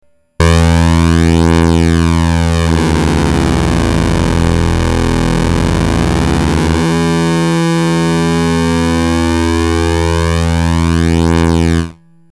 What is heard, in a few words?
phatt square